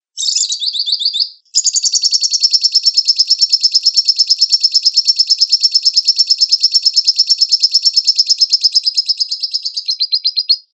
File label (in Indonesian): suara love bird